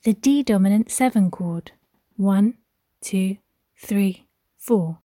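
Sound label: D dominant 7th chord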